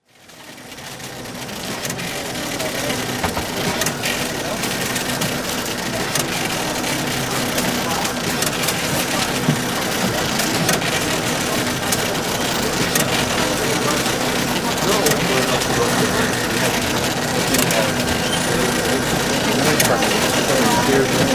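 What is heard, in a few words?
office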